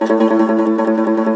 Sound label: cumbus saz A1, plucked strings; E-MU Producer Series Vol. 7 – Old World Instruments